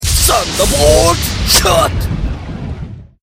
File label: bolt